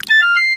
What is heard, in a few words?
item placed in basket; princess